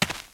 dirt; firefight

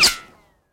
colt wall; firefight

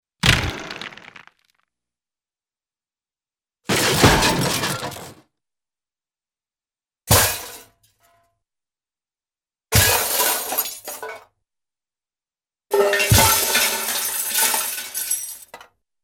Wooden Impact Metallic Junk Crashes, cartoon; Hollywood Edge; Cartoon Trax

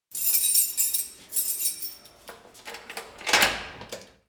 prison; Inquisitor 3

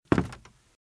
Footsteps - Wood - Creaky - Walk - Left

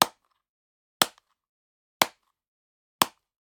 Generic Click Plastic Medium; boom library; The Interface

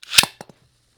bayblade carpet; devices accessories utilities; NS Studios - ultra sound collection

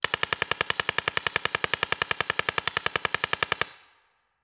Paintball Gun; Sound Ideas; Guns

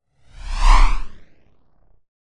Whoosh Short Jet; Hollywood Edge; sound designer's toolkit v2

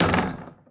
Street Ball Glass Back; SFX Kit; Street Basketball

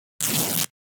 Fabric Tear Rip - Foley Cloth Movement; Hollywood Edge; sound designer's toolkit v2

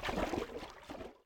Underwater - Swimming; RadwinIsland

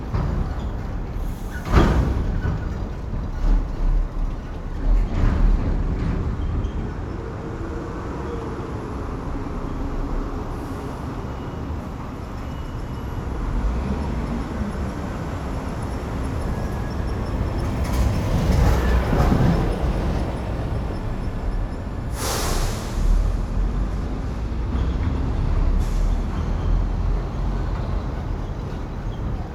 Urban traffic busy truck; urban and suburban; boom library

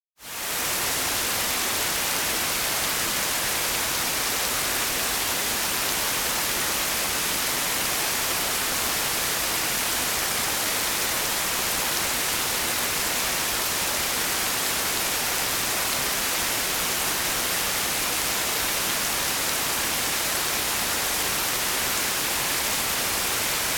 Rain Heavy Ambience - Fine Drops; Hollywood Edge; sound designer's toolkit v2

